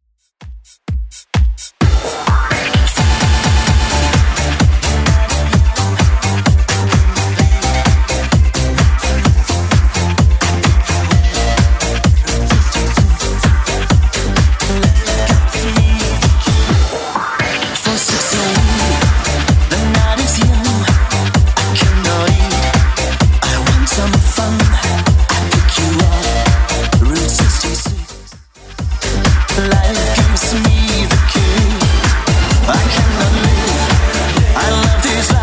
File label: 90s, 129bpm; Redrum